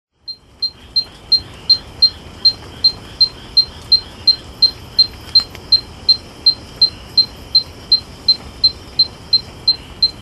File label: Anodonthyla boulengerii